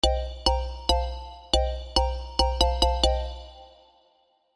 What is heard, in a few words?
xylophone; iphone 5